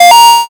coin

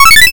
oneup

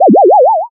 teleport

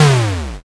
explosion s

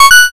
notify

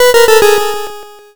lose

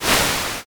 swoosh

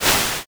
swoosh

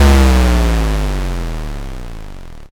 explosion l

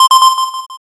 alarm

